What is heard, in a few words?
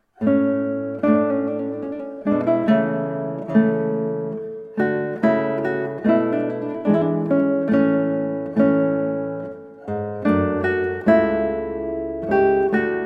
Sarabande in A Major, Guitar; Sarabande from Partita in A Major HWV 454; George Frideric Handel